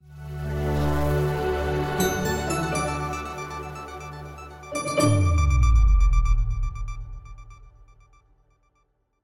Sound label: News Ident Network Logo; SoundsCrate